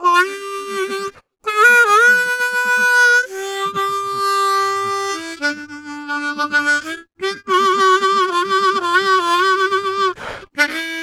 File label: Harm 129bpm G, Harmonica